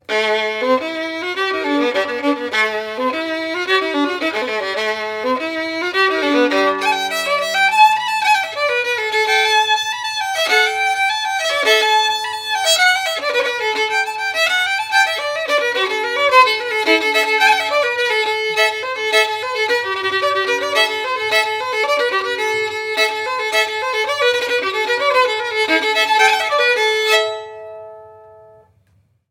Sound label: Traditional, keman; carlovy vla8 3 4 keman